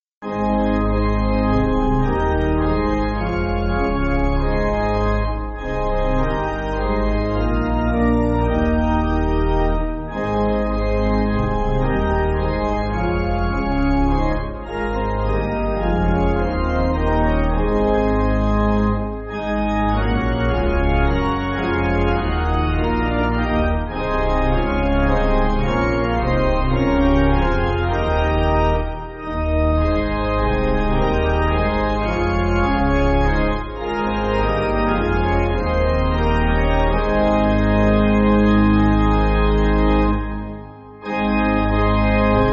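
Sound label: Pipe Organ, Backing Files for Churches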